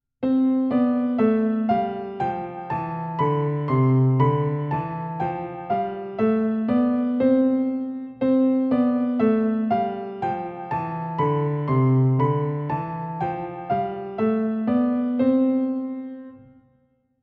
C-Dur Tonleiter Übung

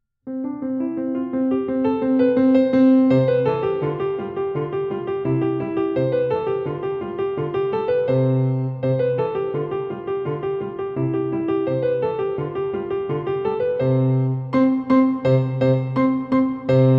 Oktaven Polka

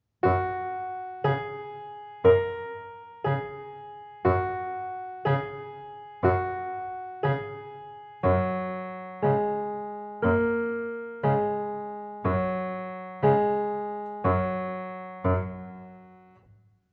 Tick Tack Uhr

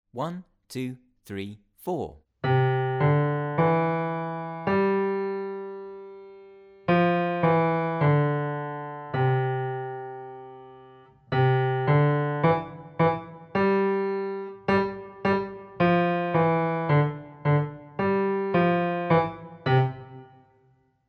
5 Finger Spaß Playalong

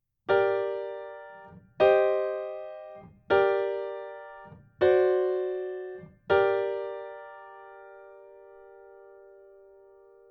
Harmonische Kadenzen in g-Moll Übung

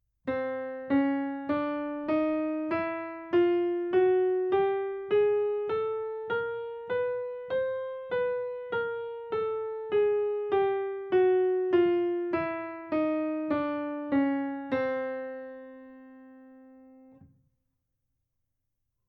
Chromatische Tonleiter Übung

